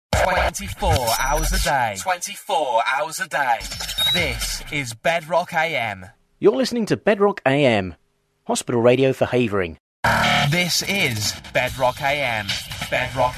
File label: AM JINGLES; BEDROCK